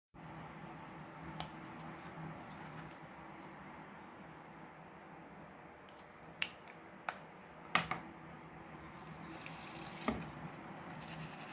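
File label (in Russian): чистит зубы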